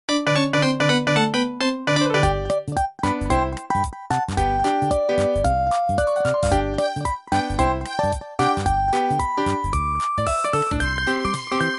lg ringtone; orange

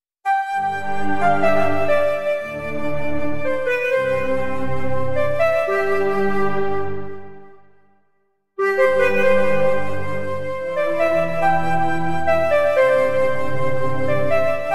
flute bgm piano cover; dhanush

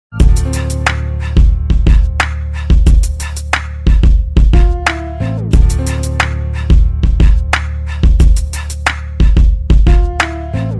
ringtone instrumental ringtones

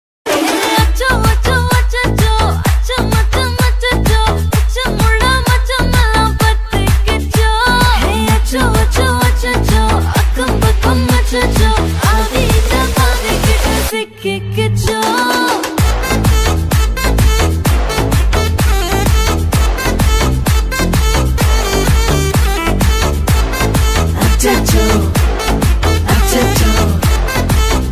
ringtone song dance bgm; aranmanai 4; tamannaah; raashiikhanna

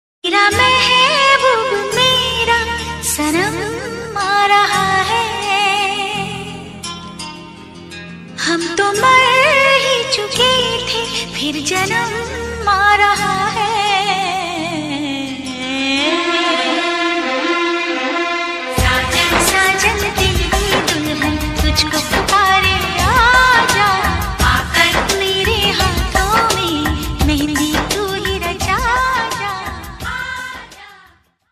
hindi old song ringtone

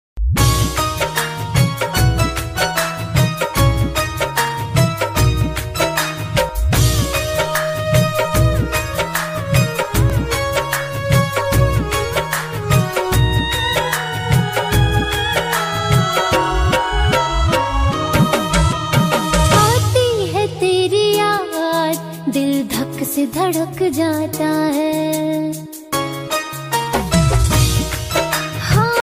hindi; saif ali khan ki ringtone

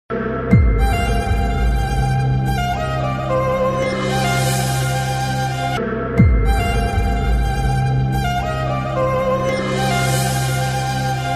sad bgm ringtone; vakeel saab

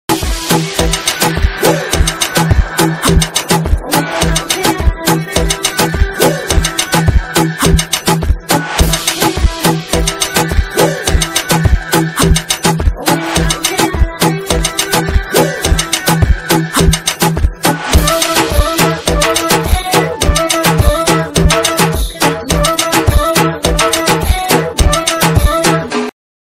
ringtone instrumental

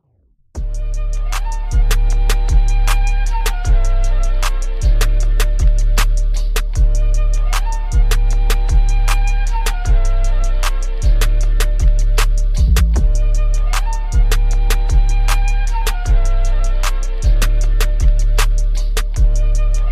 instrumental ringtone remix

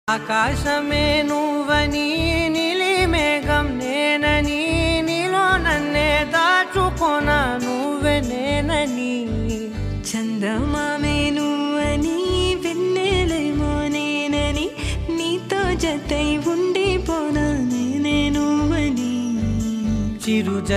song telugu

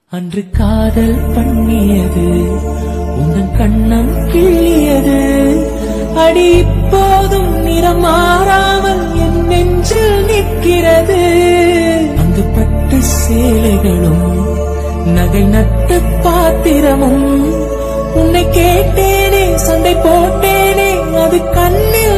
viral love ringtone romantic

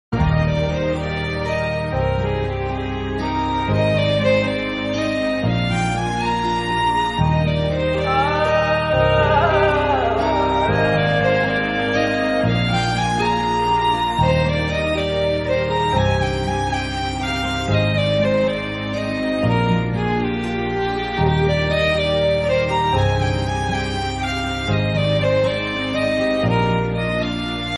love bgm ringtones

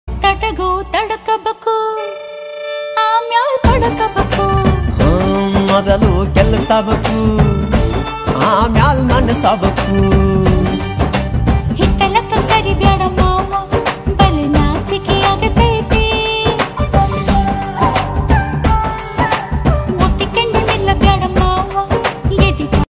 bgm ringtone, Kannada

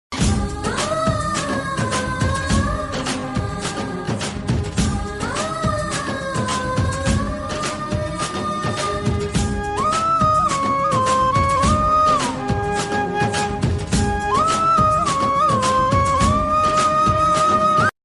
bgm ringtone telugu; yogi; prabhas

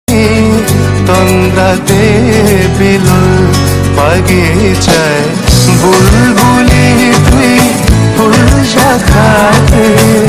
ringtone, Bengali song; cokestudiobangla

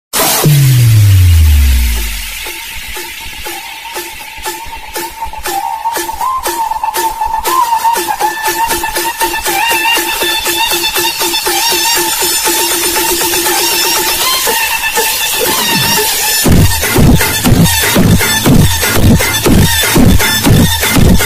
attitude ringtone

